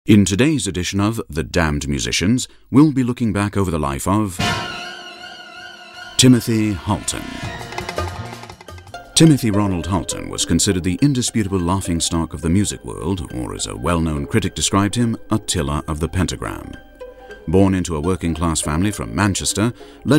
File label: Trumpet